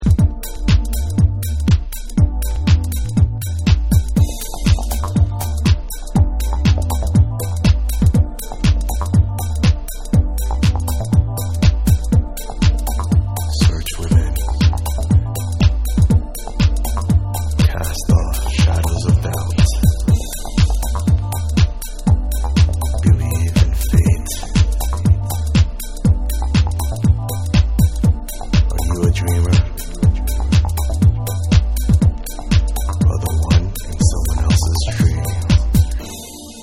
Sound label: Ambient Mix